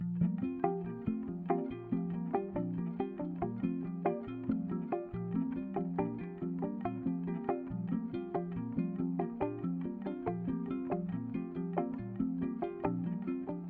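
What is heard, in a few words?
compounds together, electric bass; Together these create a curious imbalance; Compound 1 & 2 Combined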